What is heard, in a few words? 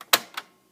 play off, Tape recorder +2A; Mass storage; zxsp